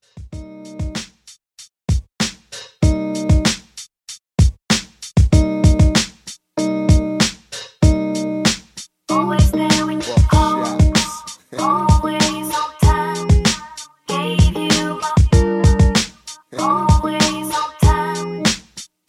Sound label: old school redrum 96 bpm